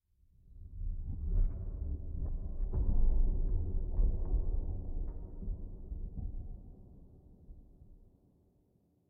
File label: Room placed low